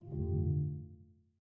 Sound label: Quest Stop; Game rip; MiSide